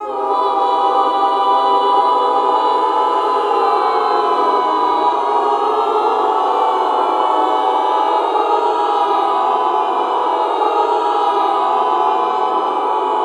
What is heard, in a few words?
CLS WAVE, Classical Choir; Best Service; Extended Classical Choir; CLUSTER